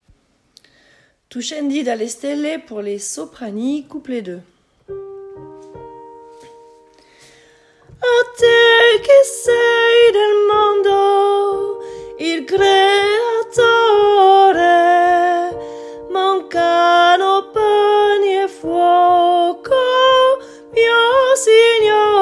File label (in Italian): soprani; pupitre soprani; Tu scendi couplet 2; enregistrements de travail